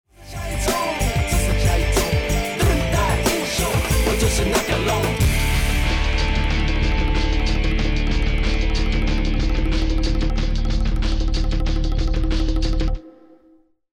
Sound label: choose team; music kits custom